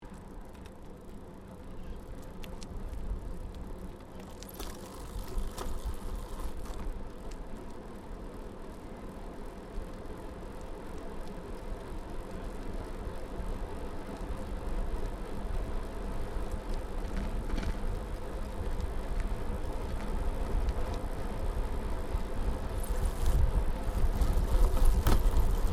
Bicycle Riding Smooth Bitumen Downhill Freewheeling Stereo, 21 speed road bicycle; Giant Crx3